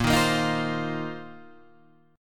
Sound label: A sharp Suspended 2nd Suspended 4th, Guitar Standard; strummed; JGuitar; 6,6,8,8,6,8